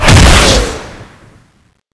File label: rocket pistol; zm boost; privilege; mboss